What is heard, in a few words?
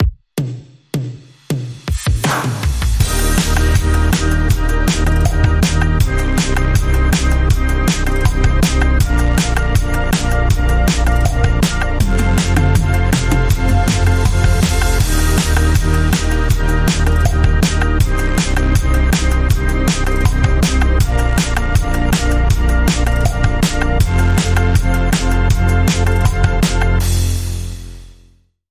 Addictive Synths, Rhythm